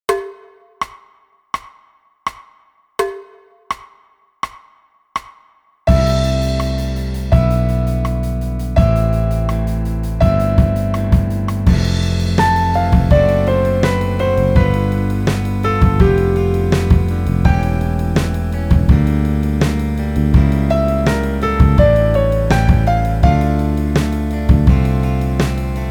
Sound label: air pop